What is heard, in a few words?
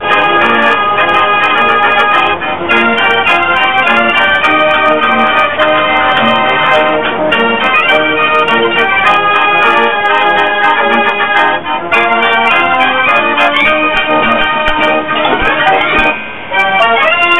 Dutch Street Organ; Australia Fair